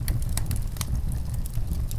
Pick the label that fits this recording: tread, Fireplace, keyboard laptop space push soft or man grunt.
Fireplace